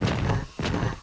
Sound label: STONE BLOCK WAR WALK